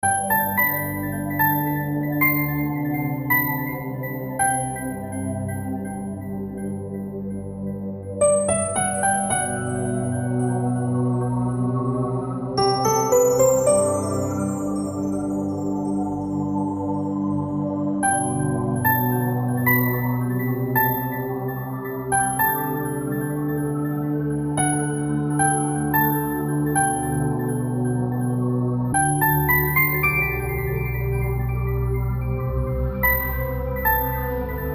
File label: Lullaby